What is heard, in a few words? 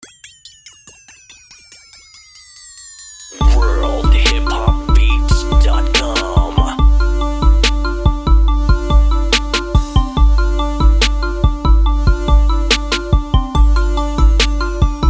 71bpm, west coast beats